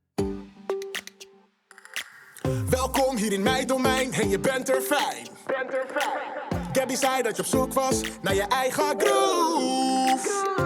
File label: Groove; Gabby Dollhouse NL liedjes